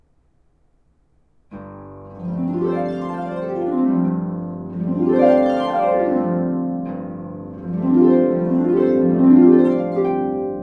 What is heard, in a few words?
Bridal Chorus gliss, Harpist; arr S. Woods; wedding march